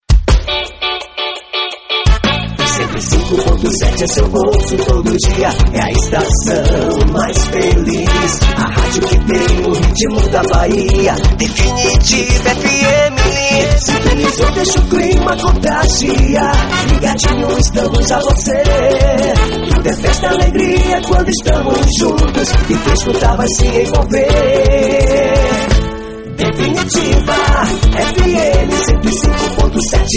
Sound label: Jingle; Jingles radio